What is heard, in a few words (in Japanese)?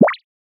ぴょこ